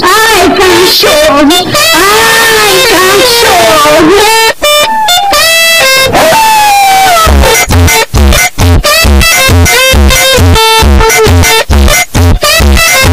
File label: aiiiiiiiiiiiiiiiiiiiiiiiiiiiii